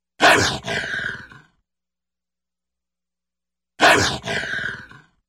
dva strashnyj broska s laem volka

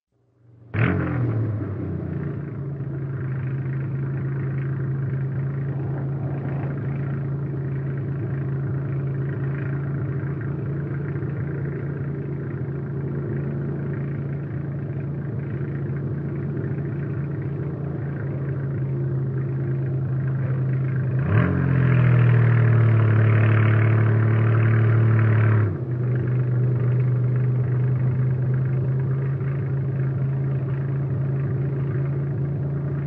dvizhenie po bezdorozhju

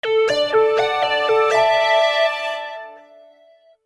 perehod k sledujushhemu turu

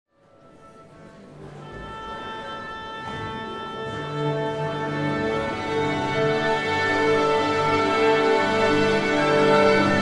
Nastroyka simfonisheskogo orkestra

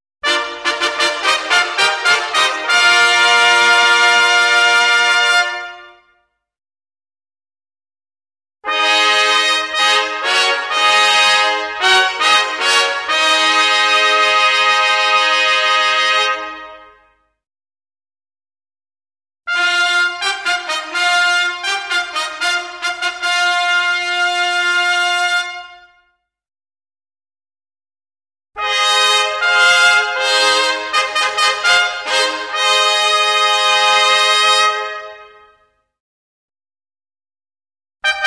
Torzhestvennye; Звук фанфар на начало праздника, на выход ведущих, или перед награждением

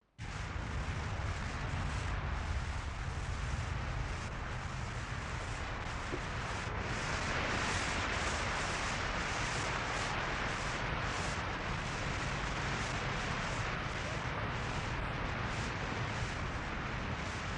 Shum vetra v trostnike

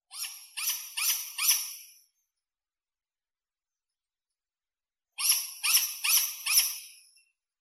golos sletka borodatoj nejasyti

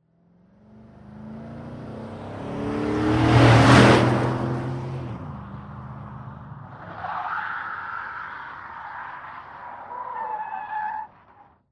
pronositsja na ogromnoj skorosti i rezko razvorachivaetsja s tormozom

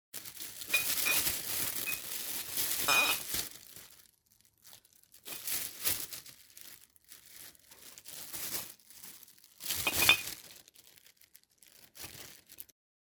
butylki v pakete

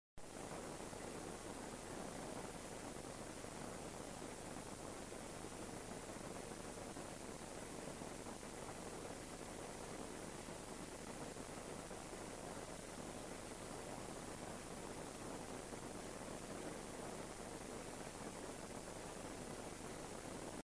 Shum vetra v kamyshe

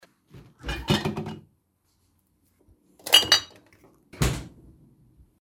iz holodilnika

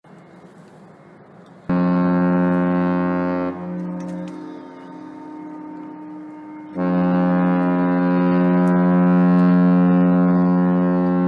gudok laynera

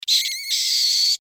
pisk odnoj myshi

